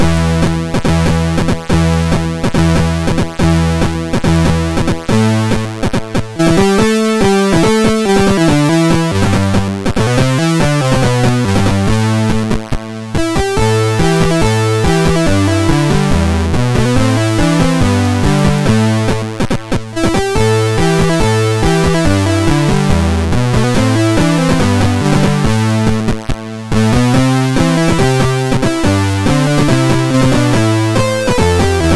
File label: Swedish Song Remix, sid, MOS6581R2